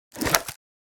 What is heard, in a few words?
Wicker basket lid rustle and close